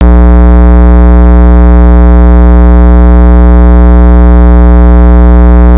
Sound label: pop